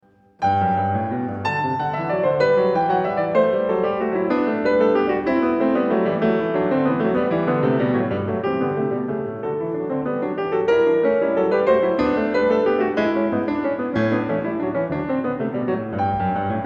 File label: Goldberg Variations BWV 988 - Variatio 6 Canone all; Lang Lang; Иоганн Себастьян Бах JS Bach; Canone alla Seconda